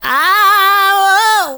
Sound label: MALE SOLO, Heart of Africa